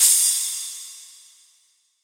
drum crash c sharp key, Royality free crash cymbal audio clip tuned to the C# note. Loudest frequency: 8127Hz